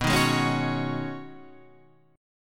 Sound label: B 6th Add 9th B Guitar Standard, chord; strummed